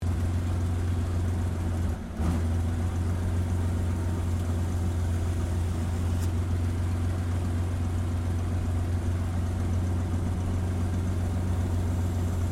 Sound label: AIRCRAFT CAC CA-18 MUSTANG MK23 IDLE INTERIOR STEREO, WW2 Mustang fighter plane; Aircraft Cac Ca-18 Mustang Mk23 1950; Airplanes